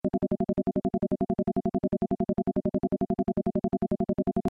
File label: short loops; monopol